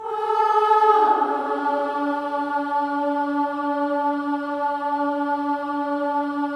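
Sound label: Voices, INTERVALS, 5TH DOWN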